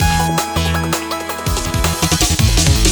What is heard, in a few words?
Transition; sky rogue